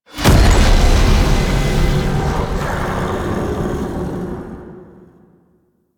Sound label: Alchemy Fully Filled Stinger; Warframe; Entrati